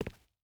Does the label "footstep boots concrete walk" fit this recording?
Yes